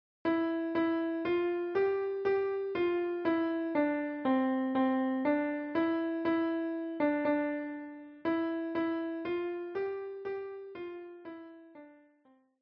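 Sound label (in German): Freude schöner Götterfunken, Klavier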